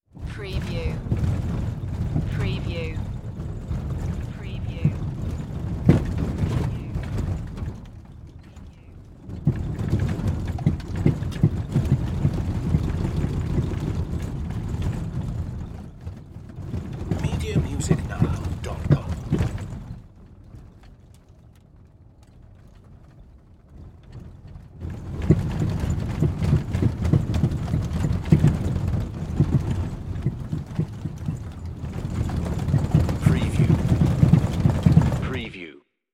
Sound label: Auto Drive Interior; sound effects bible